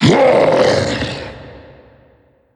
meph attack; slender

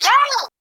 squid idle; tf; pets

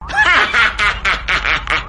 haha; dora

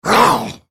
spellcast effort; Volibear

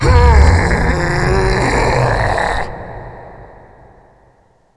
supermutant groan

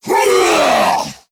attack; tf; slender; shaolinshuffle

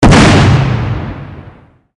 blast use

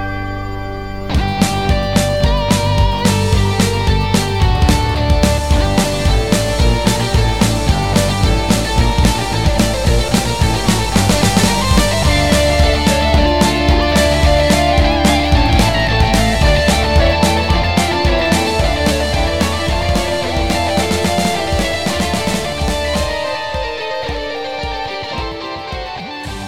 fugue

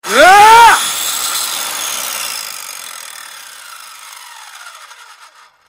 cannibal attack; frank

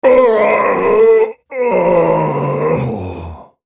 dying; Volibear

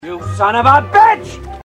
sob; hl2dm; oppressive territory; mask

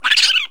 penguin; tf2pets

